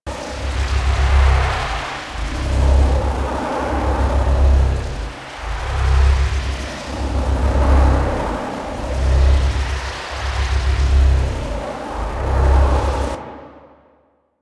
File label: Laser Ring Loop; Warframe; Ambience; Rail Jack; Pluto Charon Region